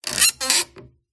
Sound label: Stress Creak Squeak Groan; PMSFX